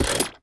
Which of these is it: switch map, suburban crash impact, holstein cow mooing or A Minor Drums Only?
switch map